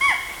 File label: bump